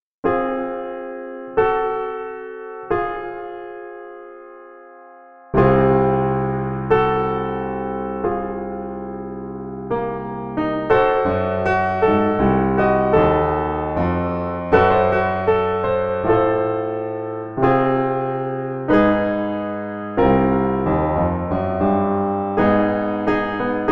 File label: pump1, pump tunings